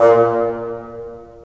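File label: WND BN, wind bassoon; LA Composer vol. 3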